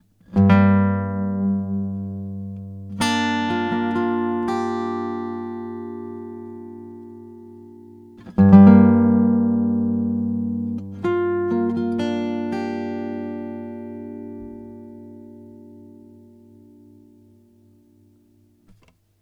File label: Gm120 GTR impro